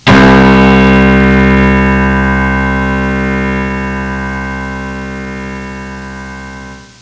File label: piano sustained note